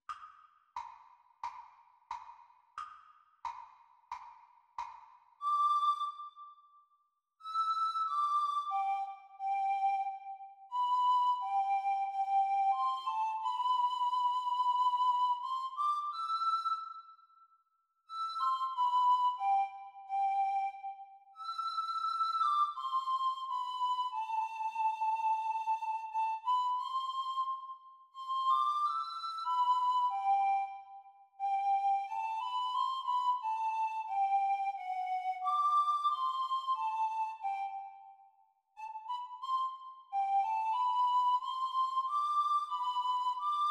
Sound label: Descant Recorder Practice speed; Grade 3